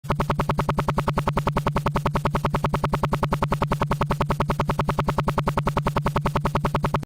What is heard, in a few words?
flying sfx; miles tails prower